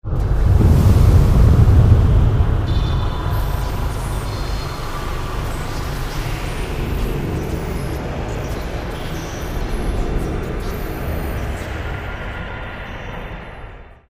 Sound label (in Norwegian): stykke romling